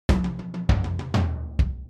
Cyber Punk Drum Fill 100 Bpm

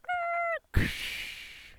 Breaks

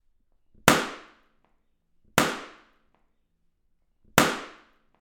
Balloon Pop

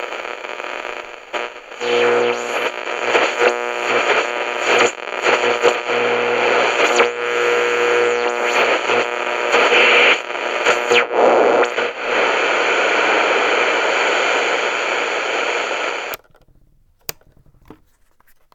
Radio Tuning Static Interference

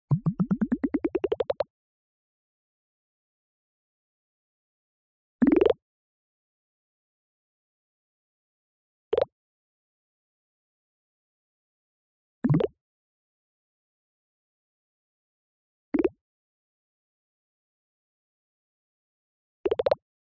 Toonpop Synth Bubble Sequences Funky; Audio Fass

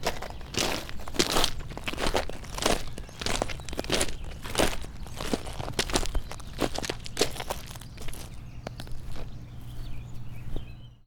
Footsteps On Big Gravels

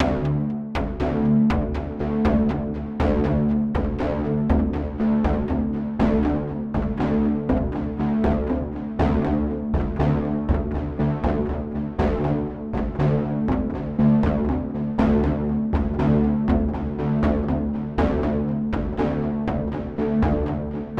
Background Beat In Dmaj

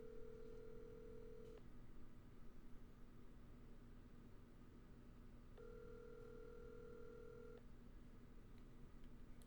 Iphone Calling Tone

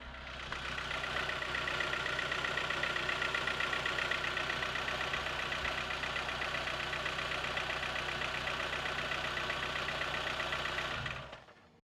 Car Engine Renault Car Engine Sounds

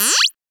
Pickup Sound

